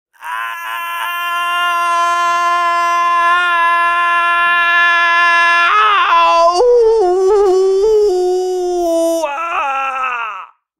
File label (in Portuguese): homem gritando